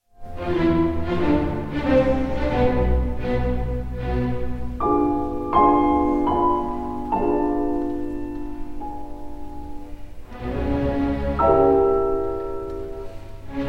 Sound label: concerto no 4 in g major op 58 for piano and orchestra